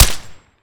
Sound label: fire, weapons